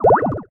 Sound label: Refill; Dr. Driving 2; Android